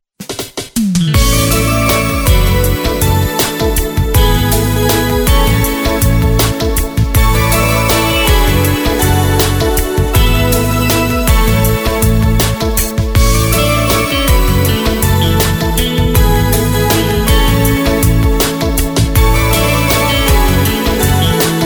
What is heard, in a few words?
Korg Pa3X